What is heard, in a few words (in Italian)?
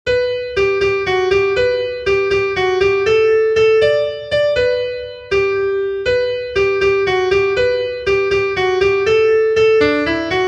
Melodia popolare, pianoforte